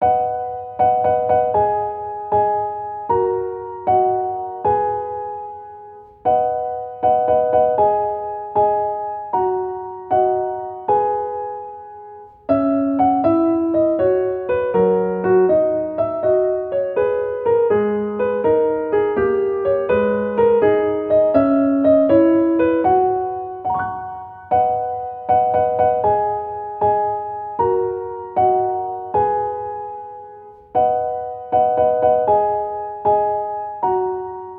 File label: marche piano; classic; tchaikovsky